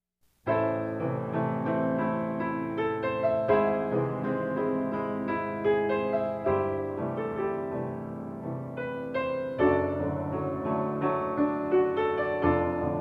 Concerto for Guitar Orchestra Andante Appassionato